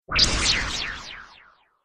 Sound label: Laser Swish Delay, whoosh sound effect